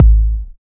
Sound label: rich low end kick g sharp key